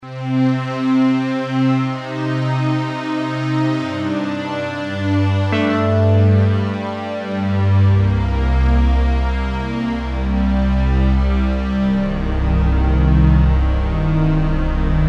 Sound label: HIP HOP Producer Stringpads